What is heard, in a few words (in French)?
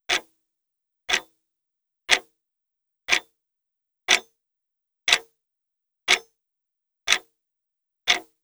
horloge; deambuler